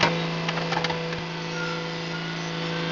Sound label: GEAR